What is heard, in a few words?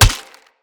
Hammer Impact Flesh; Weapon Impacts; Player; Combat; Tuvalkane Hammer